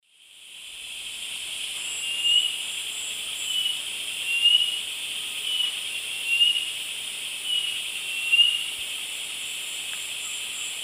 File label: Laniocera hypopyrra, song; S. America; Eastern Lowlands